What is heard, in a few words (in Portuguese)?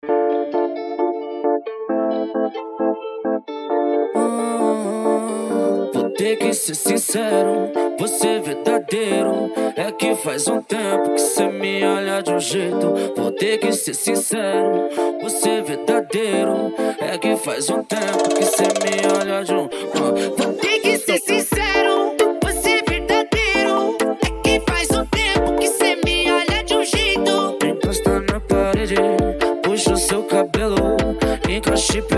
som automotivo; elite car